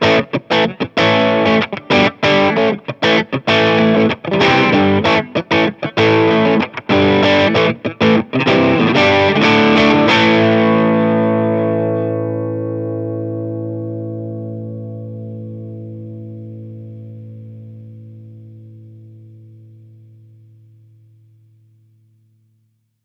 od strato keski

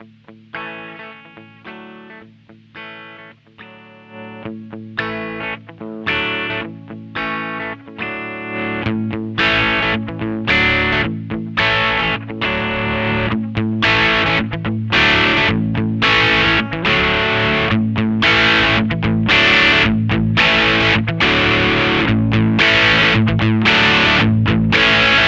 solo strato talla volumepot; v-twin

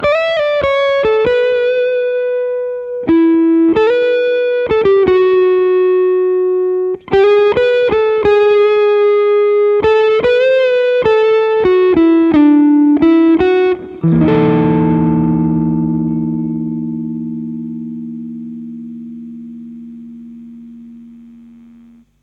keskiasento, peavey

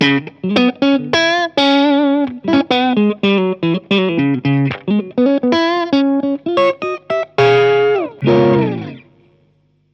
od strato kaula ja keski; dsl401

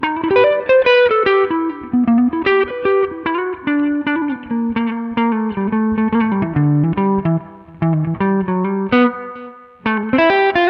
jats lp; pod2

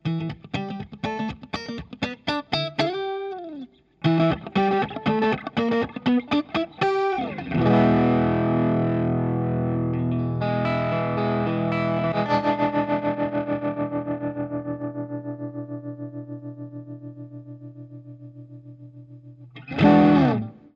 sparkledrive strato kaula ja keski

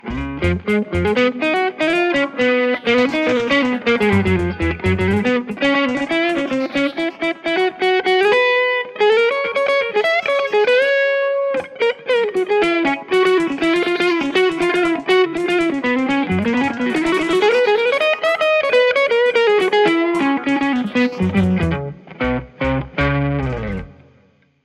kitarasyna; guitarsynth

